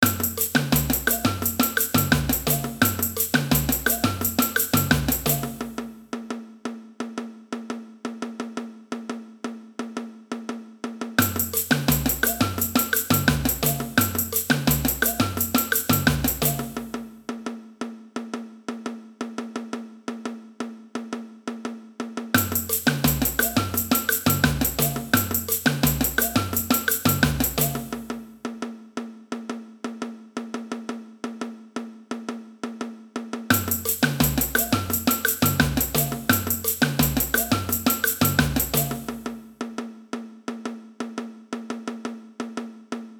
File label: tam, samba; samba band